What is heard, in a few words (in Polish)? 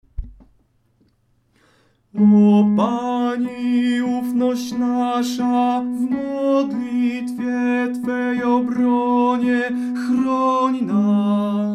tenor; O Pani ufnosc nasza